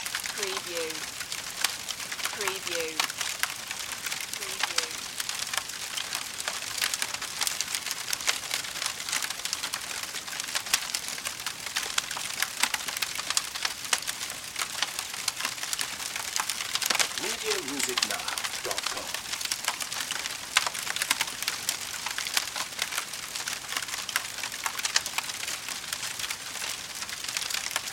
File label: Fire Wood Crackle